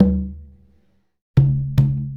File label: Congas; Essential Percussion